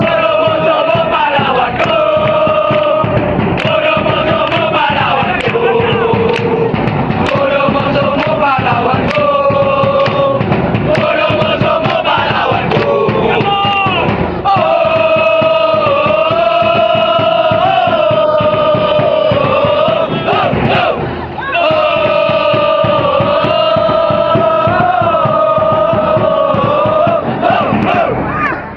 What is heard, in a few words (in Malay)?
bunuh musuhmu pahlawanku fanchants